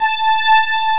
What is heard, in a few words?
DIDI PAD, SYNTHESIZER